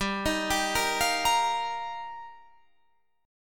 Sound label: G Minor 7th Sharp 5th, Guitar, Standard; Gm7#5; arpeggiated; JGuitar; 15,18,17,15,18,18